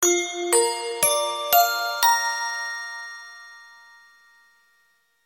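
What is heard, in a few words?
dinner chime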